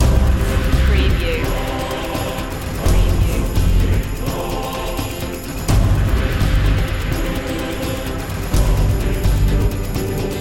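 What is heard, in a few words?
song of glory background choir; 21st century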